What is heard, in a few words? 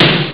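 snare drum; Amiga Soundtracker sample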